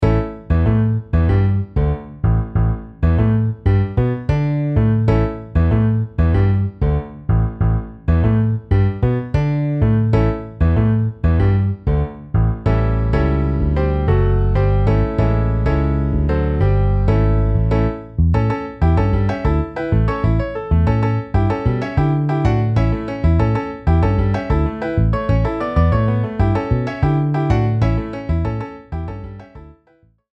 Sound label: Piano Bass